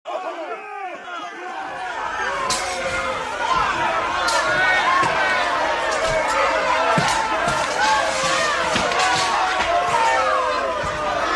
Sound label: bar fight with crowd sound effect